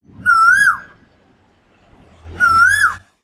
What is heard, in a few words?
Ringtone Koel Bird Call Double; iphone ringtones